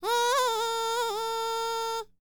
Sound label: BULGARIAN, World Voices, BALKAN